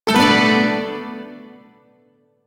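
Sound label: Shawzin Major Chord; Warframe; Duviri Shawzin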